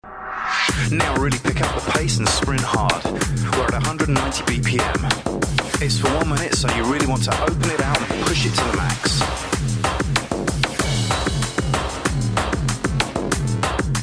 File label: 190 BPM